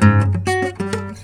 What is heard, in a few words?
missed beat; weapons; dota; largo